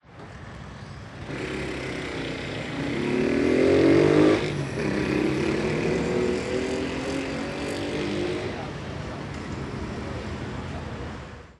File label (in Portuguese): Moto Acelerando e Saindo; maquinas; colecao sonora do cerrado